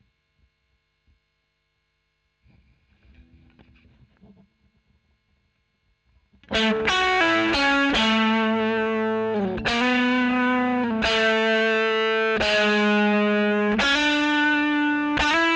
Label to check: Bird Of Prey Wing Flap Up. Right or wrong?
Wrong